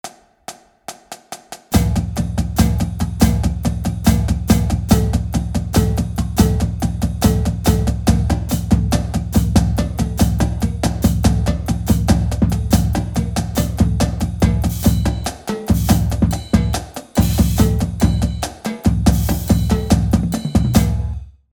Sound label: Accent Tap 114, drumline exercise